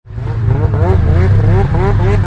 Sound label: Snow Mobile Alert